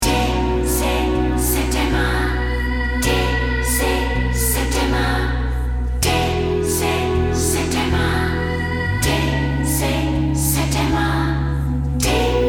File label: Healing Melody